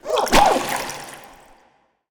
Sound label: Water Droplet Attack